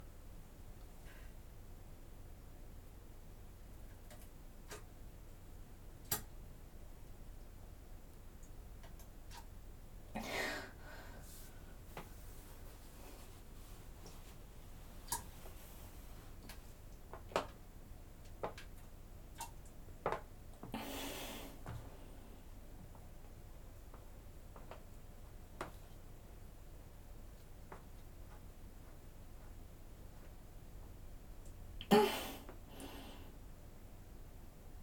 放屁放尿脱糞音声集(きばっている音も含めたロングバージョン)

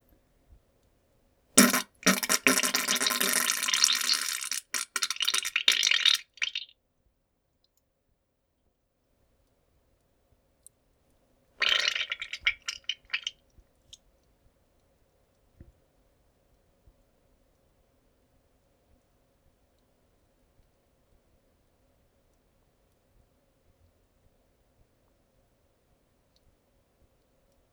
ぶぷっ ぶぷ ぶぷぷりゅりゅりゅ ぶりゅりり 間が長いです ぷりゅっ り

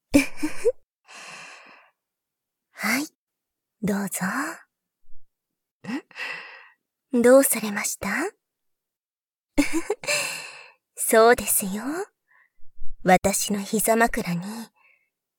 耳マッサージで緊張をほぐして バイノーラルマイク 効果音あり大きめ, ASMR

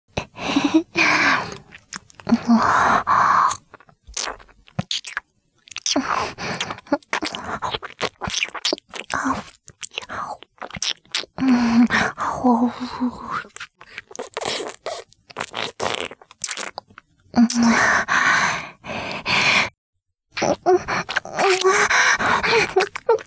耳舐め, ASMR; リナ; ＳＥなし

